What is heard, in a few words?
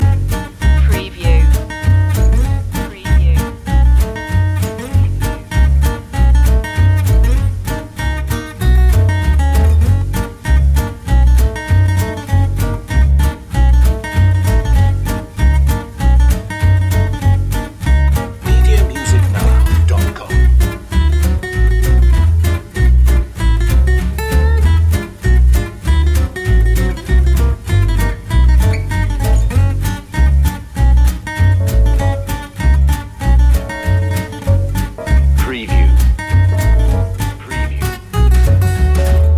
feel good swing loop